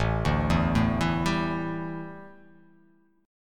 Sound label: A 7th Suspended 4th, A, down